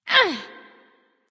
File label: grunt hit; smite; neith